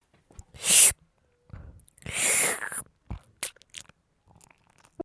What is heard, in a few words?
Sipping